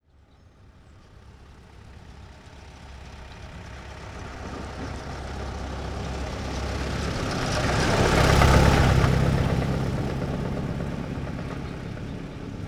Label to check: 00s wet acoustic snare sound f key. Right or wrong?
Wrong